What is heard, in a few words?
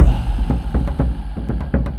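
Music Shield; From Dust; ps3; xbox 360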